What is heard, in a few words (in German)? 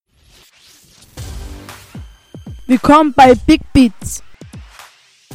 Radio Jingle